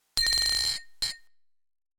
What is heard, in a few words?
Glasses Clank Short